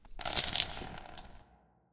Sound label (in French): eau